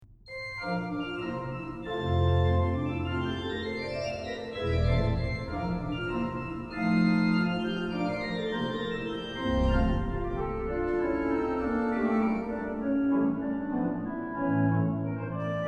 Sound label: six pieces for organ marcietta